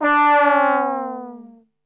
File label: D 2X TRBL OF, Brass; Best Service; Brass Super Section; 90 s Sample CDs